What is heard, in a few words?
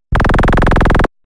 Laser Like Synth Rapid Laser; sound spark